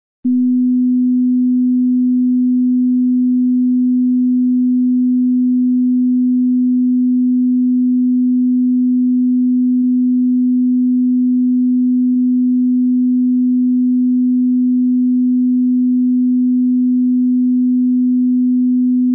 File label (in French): sinus 2500Hz; test bluetooth